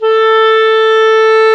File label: CLAR, Clarinets